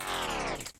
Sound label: Turret Deactivate; Lethal Company